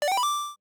Coins, notifications; Google Pixel 4; Retro Riffs

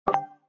action confirm; Samsung Galaxy S10; Velvet

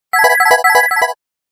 Serene tone

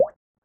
Drop, notifications; OnePlus 11

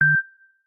Beep Once, ringtones; Samsung Galaxy S22 SM-S901U

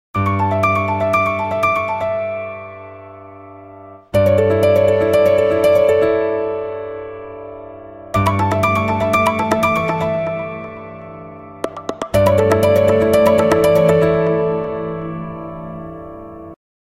Nokia Tones; Wheels; Windows Phone apps

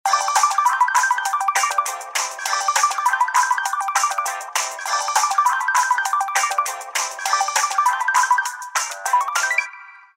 chat, ringtones

